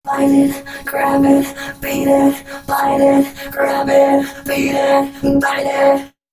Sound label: female c#, Dance Ultra Voice FX; Mutekki Media